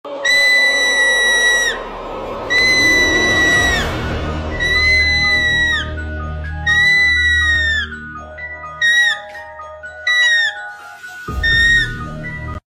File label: Cat Screaming